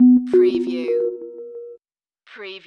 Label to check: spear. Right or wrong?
Wrong